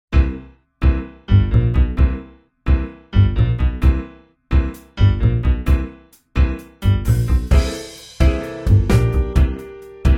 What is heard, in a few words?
Bluesy Rythm